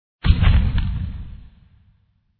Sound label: fire attack contact up; krofdor